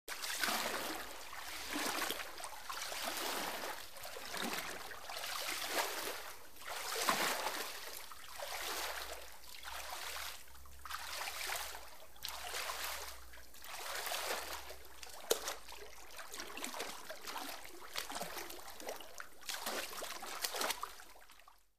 Swimming Light Arm

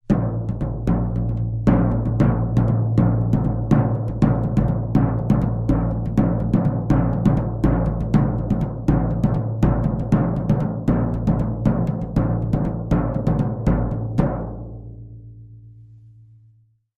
Drums Toms Rhythm, drums tom

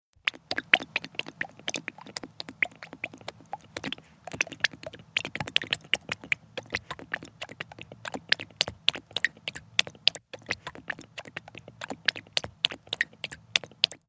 Dog Lapping Water; mvmt

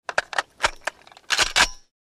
Load Cock M14 Rifle

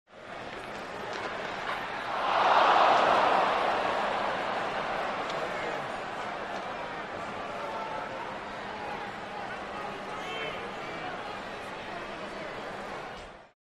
Large Sports Crowd, reactions

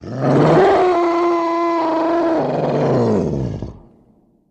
Enraged dinosaur; animals wild dinosaurs

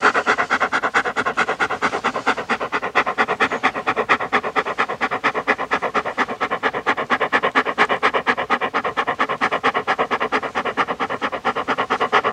Dog Pant Rapidly Clos

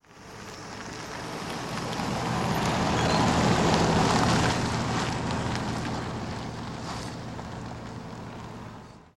Car Tires On Gravel St

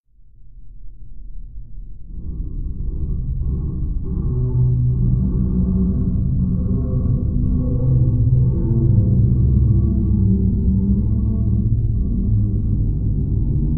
Underwater Int Low Me, metal groan atmospheres; Underwater Int Low Metal Stress Ver 2

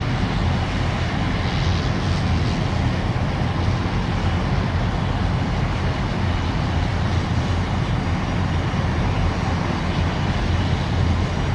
airport prop, land & takeoff

